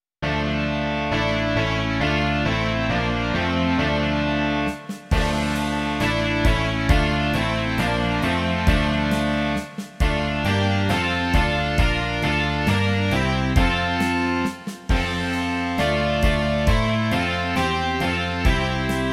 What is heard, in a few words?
rock